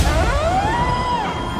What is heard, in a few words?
Mothra imago